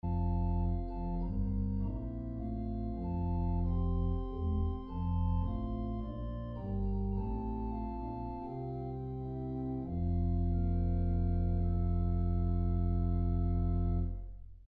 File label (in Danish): Kirkeorgel; Kettrup-Kirke; Lysets Engel gaar med glans; Kroppedal Museum; Alpegården